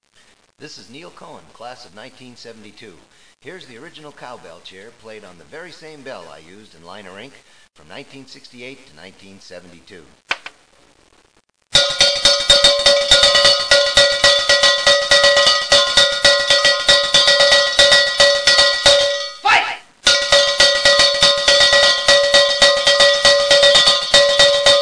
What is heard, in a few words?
cowbell